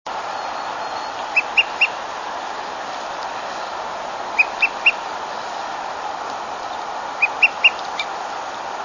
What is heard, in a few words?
Olive-sided Flycatcher call: triple, slightly ascending "peW, peW, peW,"; Grand Tetons National Park, Lupine Meadow Trail, 6/20/05